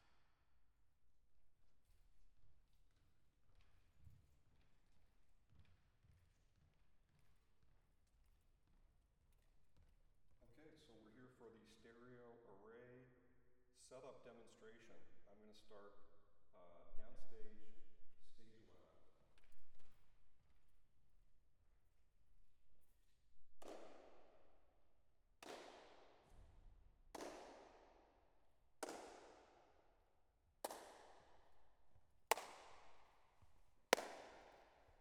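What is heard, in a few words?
clarinet; chamber96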